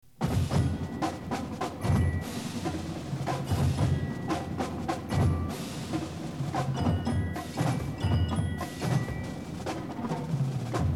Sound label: drum solo; Percussion Cadences